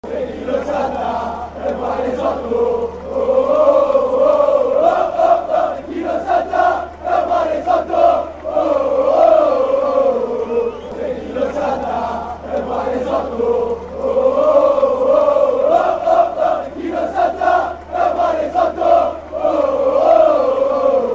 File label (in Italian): Chi Non Salta, cori curva nord